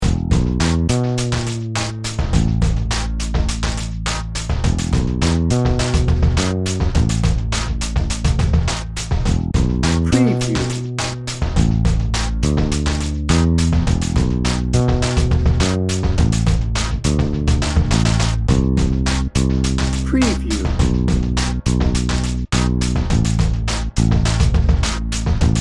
floating dreams dnb

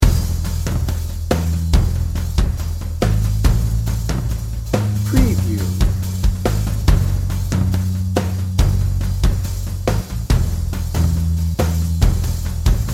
teamwork dnb

